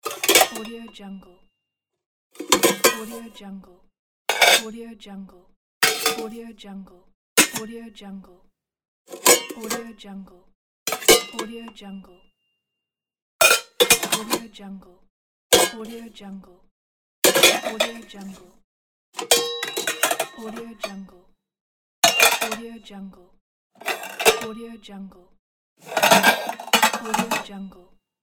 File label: cooking pots; gamechestaudio